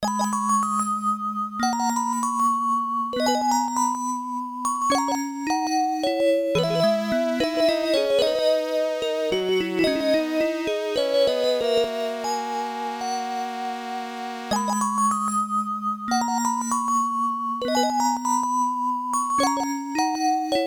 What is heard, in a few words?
Orgel; Valis 2 OST